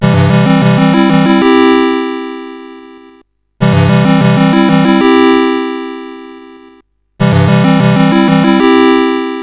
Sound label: sid CSG8580R5; Omidar